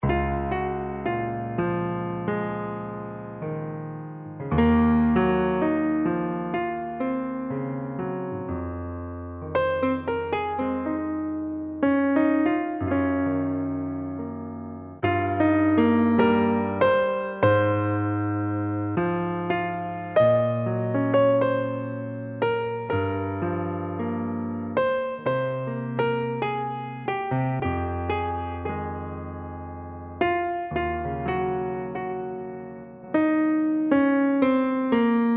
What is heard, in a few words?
Ballad for Piano